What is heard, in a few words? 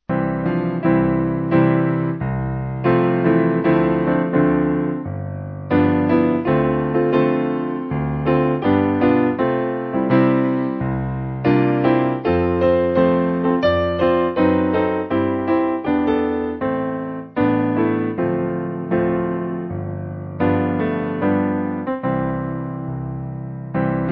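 Piano, Music Backing Files for Churches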